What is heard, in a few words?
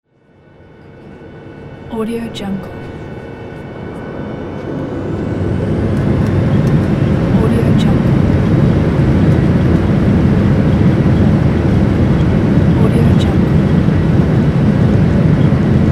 plane taking off interior